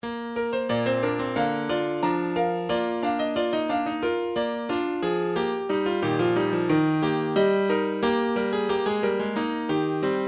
piano, midi

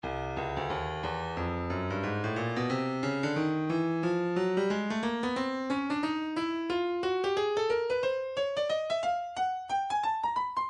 breakbeat 090 bpm; Stylus; Nu Skool

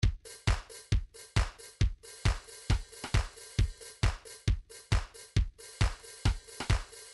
trance midi loops beats basic; newtronic trance constuction kit

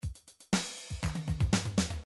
drums funk, midi drums

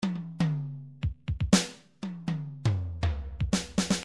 midi drums bar fill

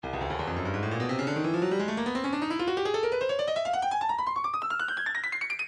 breakbeat 170 bpm; Stylus

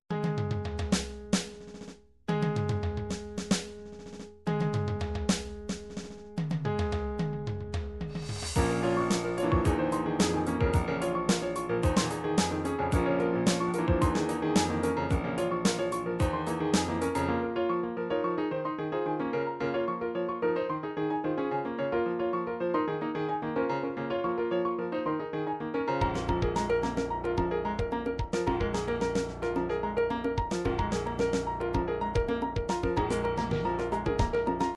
triphop; Newtronic Triphop Construction Kit

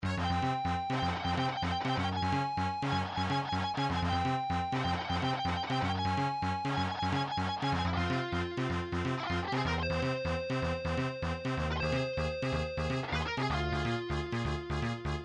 overhead battle; sequenced; nintendo; bionic commando; ryu hokoru

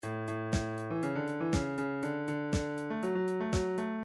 drums reggae

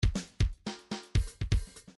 grooves funk

